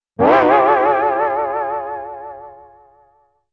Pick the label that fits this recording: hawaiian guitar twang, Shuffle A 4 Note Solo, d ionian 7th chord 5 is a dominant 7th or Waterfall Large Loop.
hawaiian guitar twang